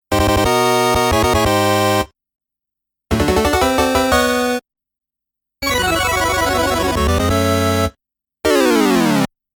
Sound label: Power Up SE; Exed Exes; Capcom Music Collection Vol.1